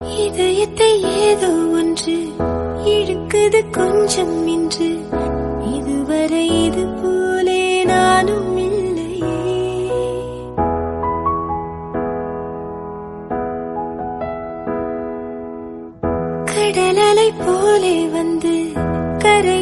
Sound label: female version, south